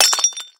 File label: Pot Break Small; Angry Birds Rio